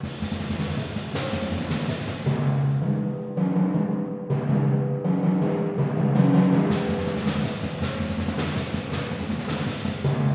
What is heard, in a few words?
Percussion Battery; Mount & Blade: Warband